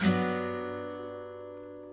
mellow guitar chord

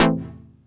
stabs hits

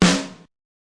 Crunchy Snare, DrumKit; REAL LIVE KITS; Osama Qureshi DrumKit

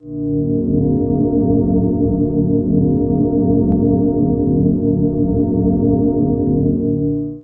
thick deep dream pad; Pads

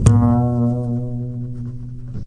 Upright Bass Notes

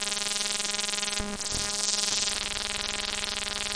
dirty annoying buzz; Electro and Synthetic

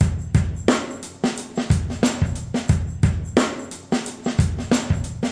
90 bpm ambient groove, drum loops and breaks

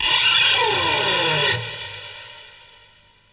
fretty dive, Guitars

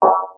squirt metal, Synth

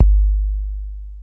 procussion 808 bd; Kicks; single hits

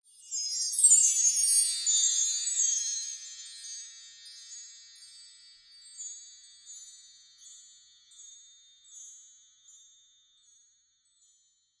bell tree tinkles; Western and Latin Percussion; single hits

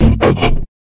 chunky bunch guitar

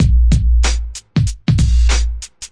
heavy kik hip hop, drum loops and breaks, 095 bpm; 091 to 100 bpm

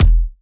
kick double trouble; Kicks; single hits

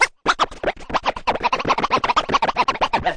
lets, Scratching and Turntablism; DRUM LOOPS and BREAKS

